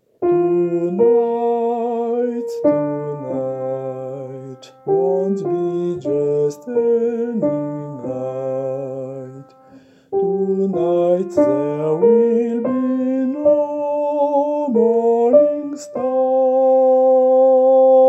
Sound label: soprano